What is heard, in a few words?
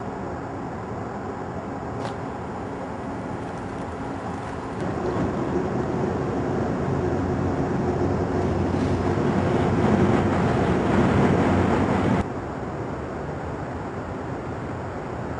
hawk